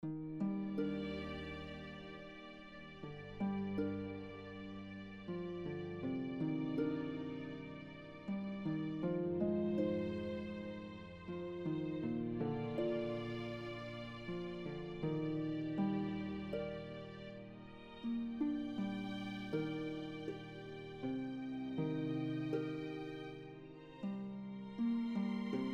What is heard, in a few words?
Tranquil Serenity Ambient Theme